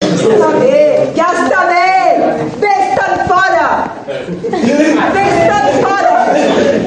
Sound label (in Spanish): grito de ayuda saturado